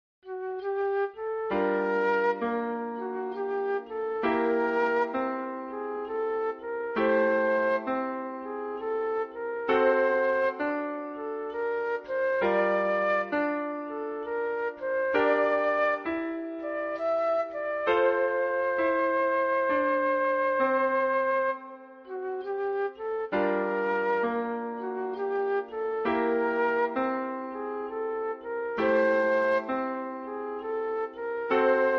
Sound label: barrow oboe